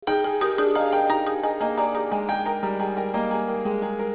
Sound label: Ring